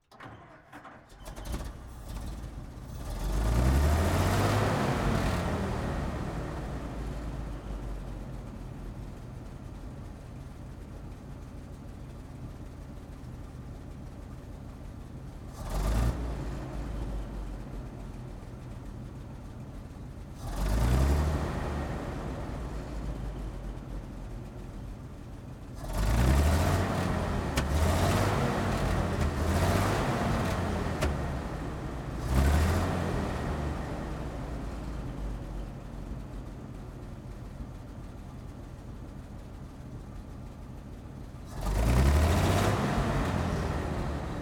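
M1 Corbitt onboard startup blips shutdown flatbed, Cargo Truck; Prime Mover; MKH418S; Pole Position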